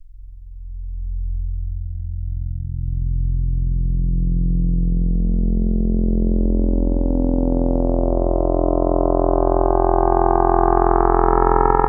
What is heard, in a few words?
Cosine; Chill; Synthetic's Serum Collection; Echo Sound Works Core Tables